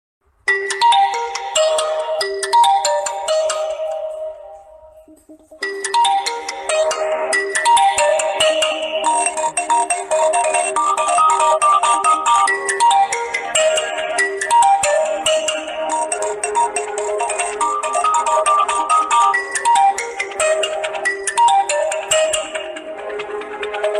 tono de llamada Oppo Find X Pro

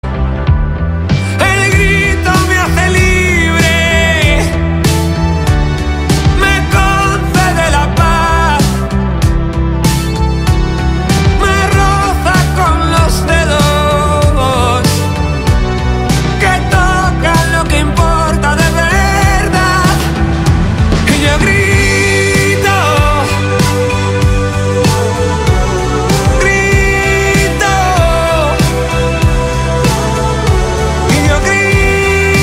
el grito

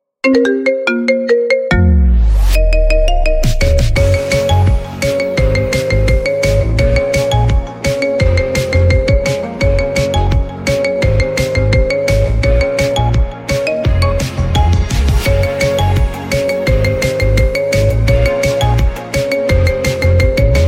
Marimba, tono de llamada

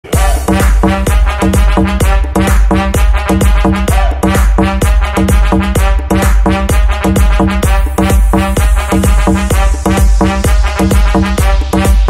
La Trompeta Del Diablo

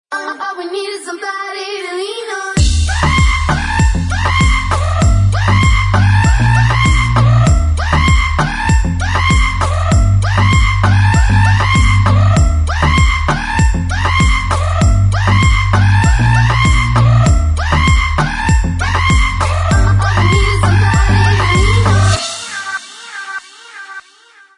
cuervo tono

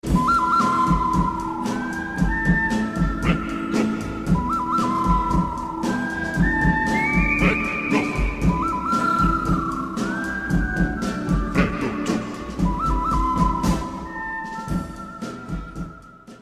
Sonido del viejo oeste

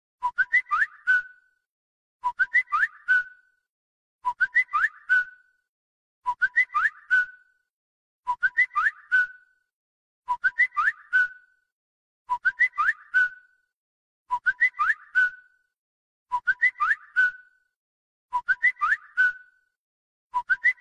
Samsung Silbido Mensaje

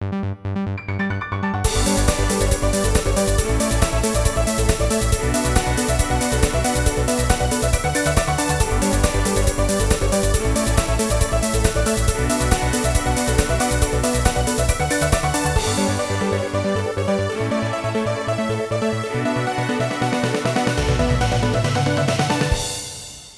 tono de llamada Xiaomi Mi Note 11

